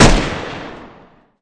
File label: mac10, weapons